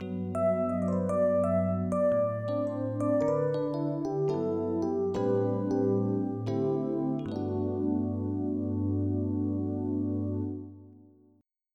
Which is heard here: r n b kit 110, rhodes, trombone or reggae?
rhodes